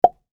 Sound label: pop